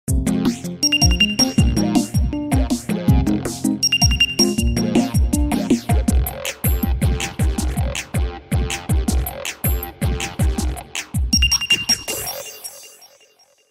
Buzzer; Vodafone; Smart-Mini; alarms

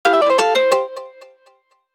Guitar Chop, notifications; Motorola Atrix-2

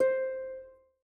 Harp, notifications

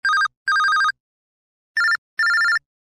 Simple Beep

